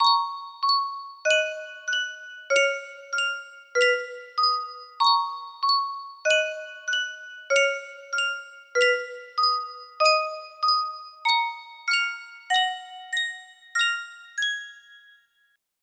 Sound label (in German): Yunsheng Spieluhr